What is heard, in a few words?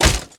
step walk